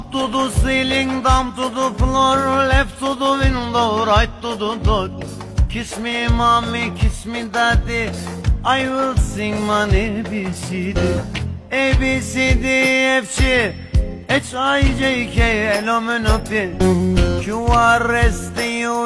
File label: abc song in arabic